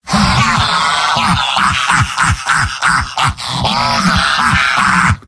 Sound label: demoman laugh long; mvm